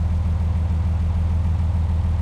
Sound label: valentine engine rpm 00 load, tanks; cannons; War Thunder